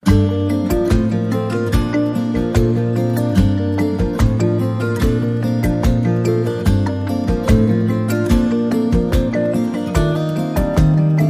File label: Serene Strings